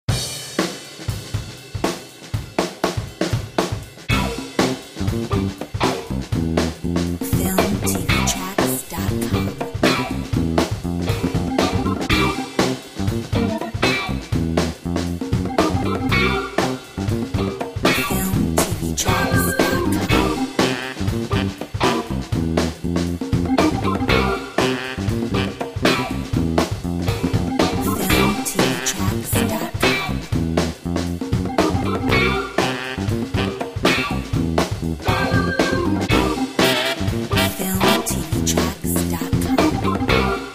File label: Funky